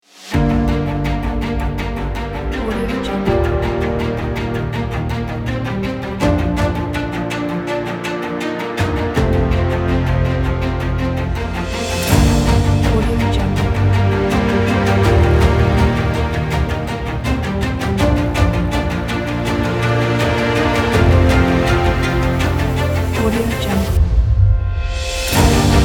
heroic

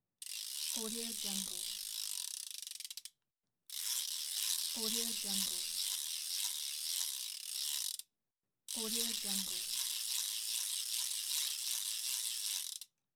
fishing reel

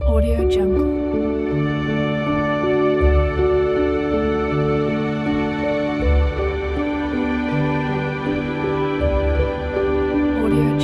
relaxed piano motions kit; exmusic

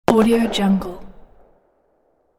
medium firework explosion; sound-ideas

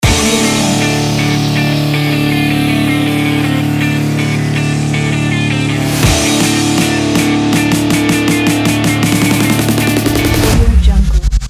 grunge stomp rock logo

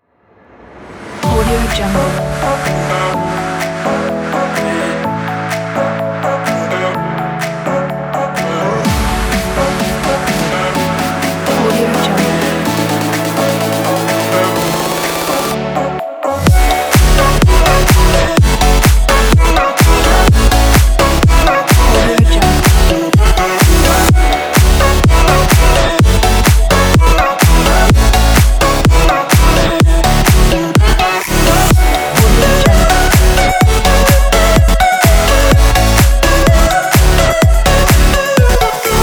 energetic party pop

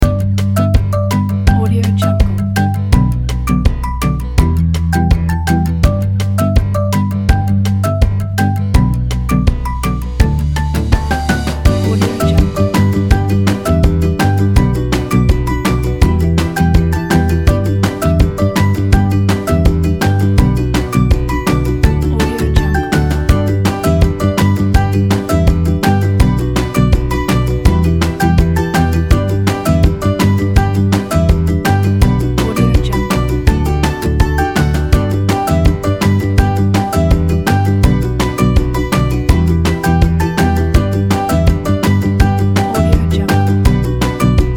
funny music

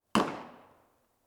shooting range

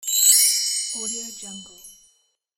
wind chimes

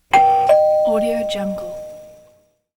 doorbell

